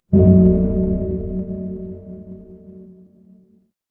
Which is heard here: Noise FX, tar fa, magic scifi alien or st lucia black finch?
magic scifi alien